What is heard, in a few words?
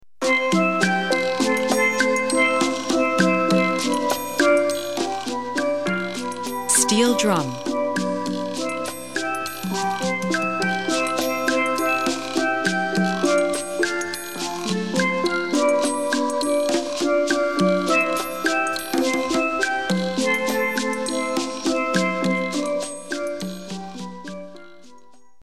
steeldrum